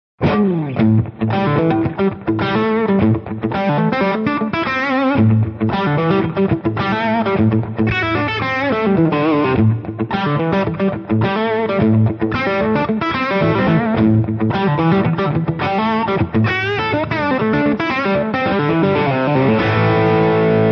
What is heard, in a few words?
Funky Crunch